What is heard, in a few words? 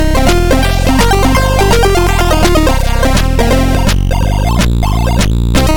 Jazz